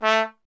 TP A; Miroslav Vitous - Solo Instruments VOL-1; Trumpet

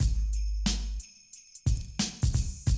Classic DrumLoops

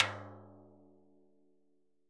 Surdo Rimshot; Best Service; Festa Latina VOL-1; Single-Hits

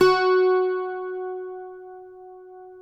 cuatro F#

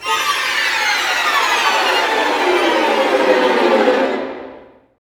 STR SEC, STRING GLISS

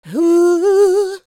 D BIT, Vocal R&B Dance

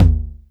MT909, TR909

